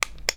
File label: Flashlight Toggle, Flashlight Clicking; Marissrar